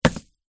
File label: food cake decorations hundreds thousands tube down on table